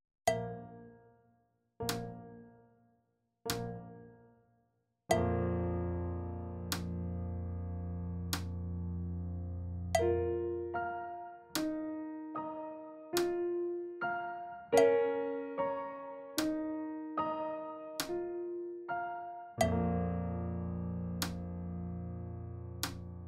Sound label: piano slow; Uebungs-CDs; Playbacks